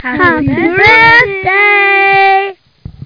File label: happyb, flute